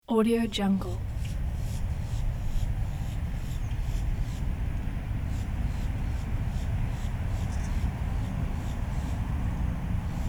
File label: ambience side street background